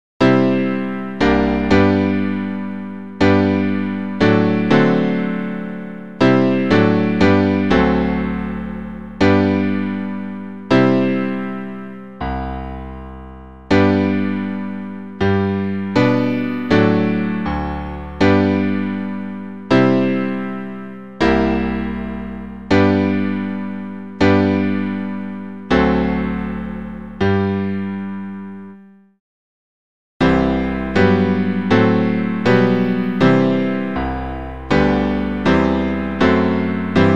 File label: accompaniment only